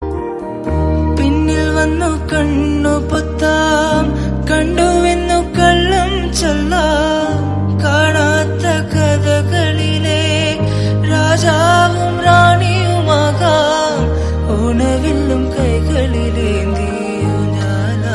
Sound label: love song